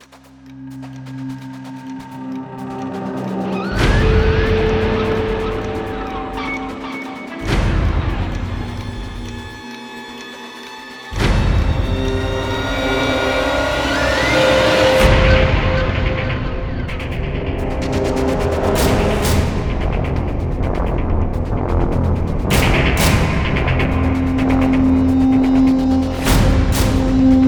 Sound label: Battle Tension